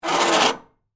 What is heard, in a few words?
Squeak Turn Metal, Pole; Texture; Squeak Sound Effects; Squeaks (Sound Effects:Objects)